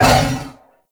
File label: Wolf Attack Short; Animals; Duviri